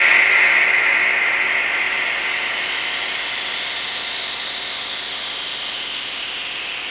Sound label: NOISE, Production Effects